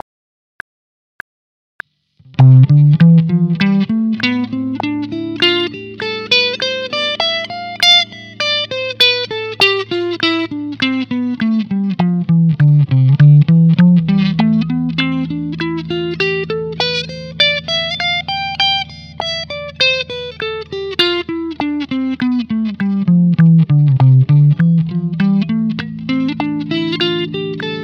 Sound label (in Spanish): Escala Mayor Figuras 1 y 2 corcheas 100 bpm